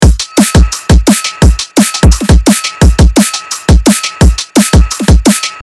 PERC LOOP 172BPM

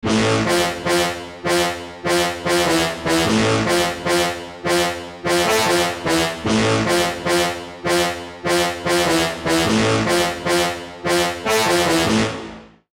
Am 150, Trap; MIDI Melody; Signal; Unison Trap MIDI Melody Collection

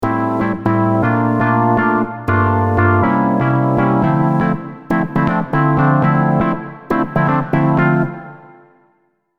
Melody Am 120bpm; Maurin; Unison MIDI Starter Pack

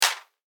Clap; BIJOU; UNISON

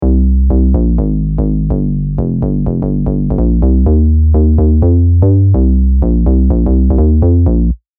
Bassline Cm 125bpm; MIDI; Unison MIDI Starter Pack

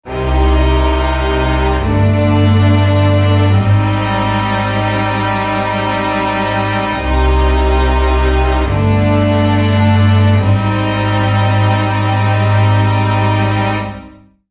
Chord Progression C Minor Prog; MIDI; Chord Progressions; Unison MIDI Starter Pack

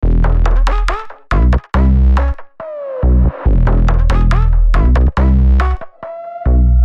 Bass Loops

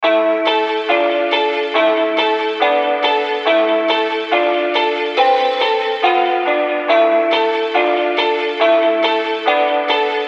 Am 140, UK Drill & UK Grime MIDI Melody; Militant; Unison UK Drill & UK Grime MIDI Melody Collection

